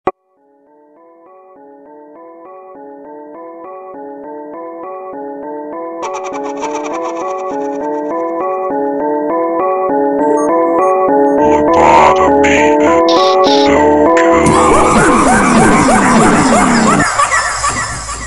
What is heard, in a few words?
super slowed x reverd